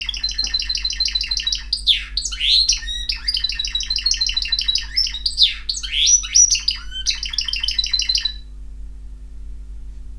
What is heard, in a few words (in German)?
Hahn